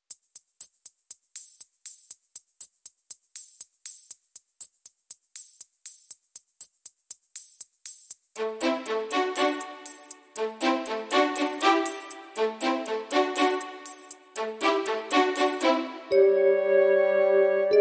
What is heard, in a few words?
Modern Percussion No Leads